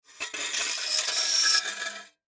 Metal Object Scrape, Metal Object Scrape Squeak; Foley; Sound Effects:Objects; SoundEffects-Blastwave

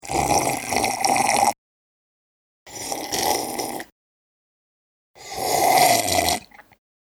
Slurps, Human drinking slurps; Slurping

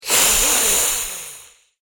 Vampire Hiss; Monster Sound Effects; Horror Sound Effect

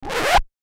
Record Scratch Short; DJ; Musical; Blastwave; Sound Effects:Musical

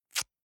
Duct Tape Strong Tape Pulled Off Reel Quick Rip 2

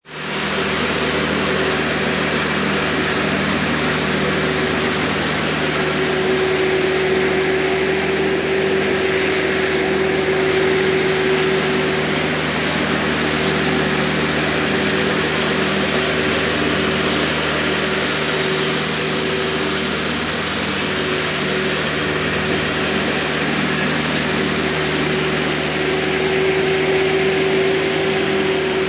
Motorized Lawn Mower

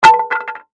Wood Plank Drop, 2X4; Sound Effects:Materials; BlastWave

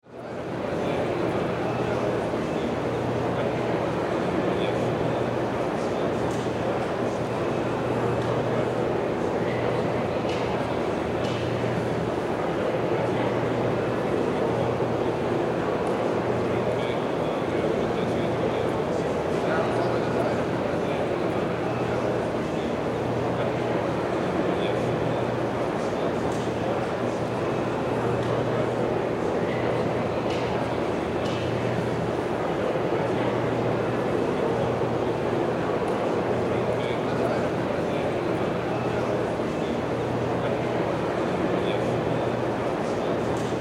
Ambience Convention Center Walla; Buildings; Backgrounds

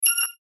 Rollover Quick Attack Fast; Mouseover Short Musical Bright Swell; Multimedia; Blastwave

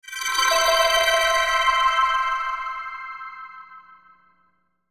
Logo Chopper Shimmer; Sonic Logo; Blastwave; Sonic Logos; Production Element Imaging Element Accent Transition

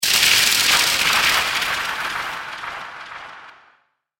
Shatter Impact, ice; Kingdom Of Ice; Scary Soundscape; fantasy sound effect; Andrew Fleming

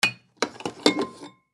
Coffee Pot Empty Grab; Hotel Room; Foley; Appliances; Household; Blastwave

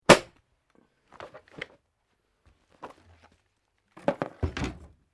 SKI SNOW BLADES BINDINGS BOOT UNLOCK REMOVE

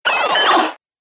Robot Droid Death, Robot Sound Effects

